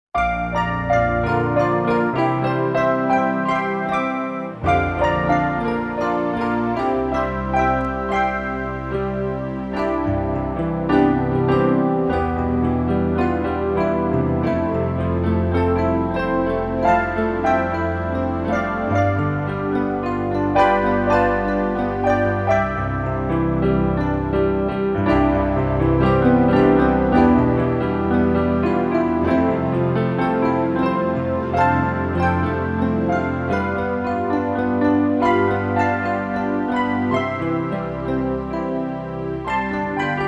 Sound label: Instrumental